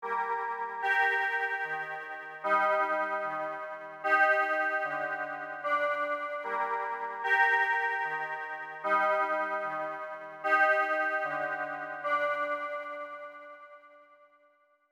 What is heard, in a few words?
Keys; PatchFactory